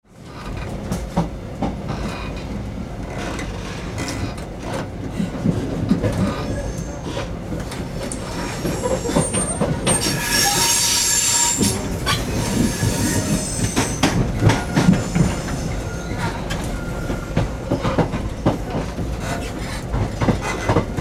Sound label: train cabin inside wheels noisy